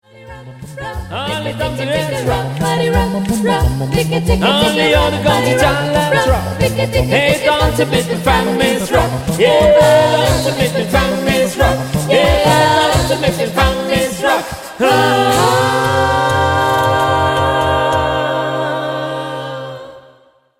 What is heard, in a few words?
Jive